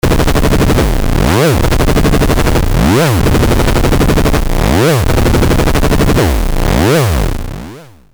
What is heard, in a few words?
LFO on VCO; neptune